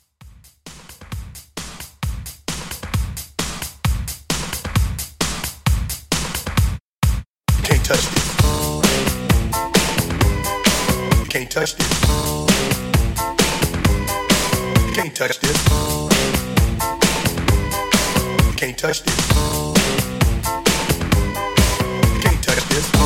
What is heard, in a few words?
80s Funk Re Drum 132 Bpm